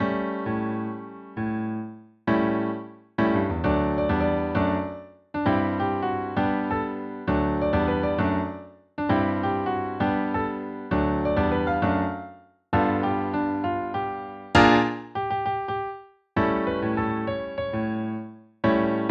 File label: accomp. w melody